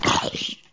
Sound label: zombie hurt, mob; Eaglercraft; eaglercraft-b1.3